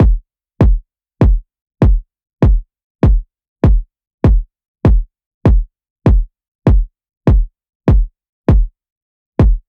Phonk 99bpm Kick; EDM Drums; Drum Kits; Stems; Ghosthack; Drum Mega Bundle Demo; Ultimate EDM Drums; Phonk Kit 03

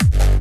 A bit shitty G (Reverse Bass), Kicks; Drums

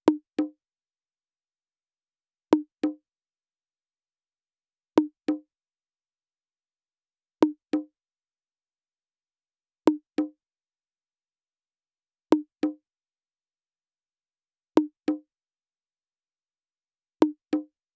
Drum Loop - 98 BPM Percussion, Afrobeats; Loop Stems; Cymatics; PARADOX II - Drum Loop Collection; Artist Drum Loop

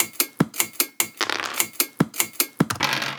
Percussion Loop, Percussion Foley, 150bpm

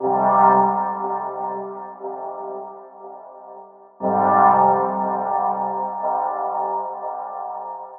Dub Chord Loop Fm9 120, Dub Techno; Ghosthack; AC2023 - Day 17 - Dub Techno & Deep House Chords

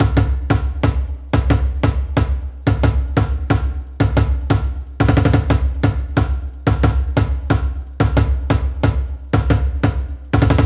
Dun Dun - 90BPM; Percussion Kits; STEMS; Ghosthack; SkyRealm; Kit 13 - Leap Of Faith

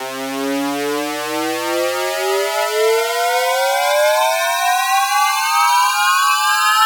Riser Prism; One-Shots; SFX; Ghosthack; Color Bass Essentials

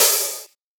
Open Hat; Ghosthack Advent Calendar 2017 Day 5

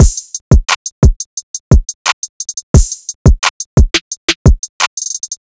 Drum Loop 175 BPM, Hip Hop; Drum Loops; Telefono; Cymatics

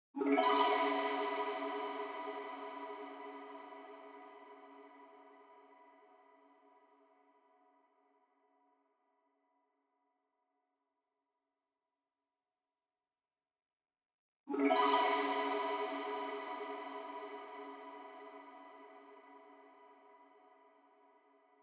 134 BPM C# Min Accent; Melody; Loop Stems; Cymatics; 2022 Melody Collection - Wavy; Skyline

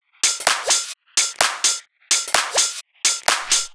Top Loop - 128 BPM F; House; Top Drum Loops; Cymatics

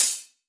Open Hihat; Cymatics; Dubstep Starter Pack; Drums - One Shots; Cymbals

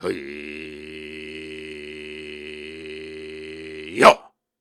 Sustains, Viking Vocal

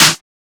Snare, techno drum shot

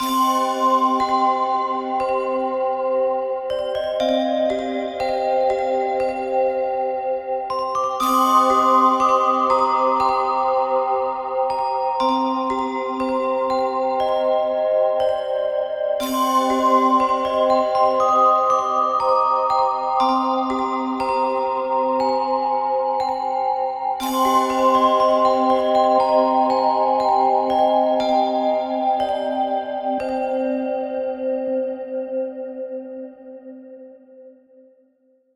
Ambient Bells - Shimmering - Cm 60Bpm; Instruments; Ghosthack; SkyRealm

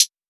closed hihat, drum one shots

